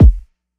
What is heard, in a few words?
kick; eddyflux; crate bd; dough-samples